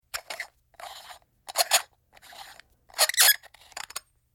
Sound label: Canister Foley Squeaky Open Close; Squeaks